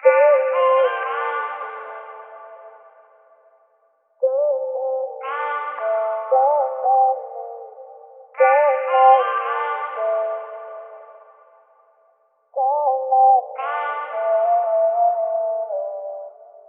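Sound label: vocal chop, 115 bpm, Gm; LetGo; Slate Digital Omnivox Vocal Sample Pack; Chops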